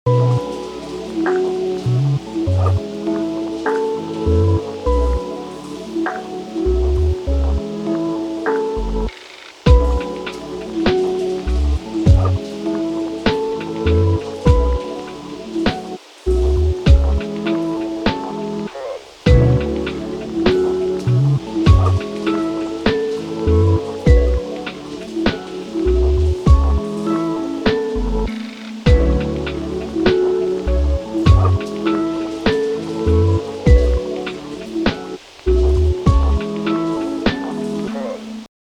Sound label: Lo Fi Hiphop Rainy Day